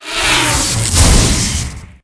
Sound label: elecbird attack